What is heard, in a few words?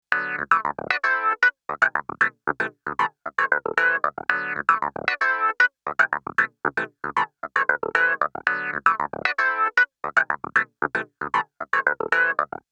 GAP Line